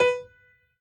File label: piano hi